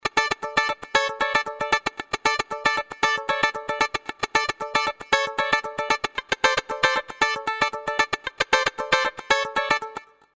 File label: funkiest arp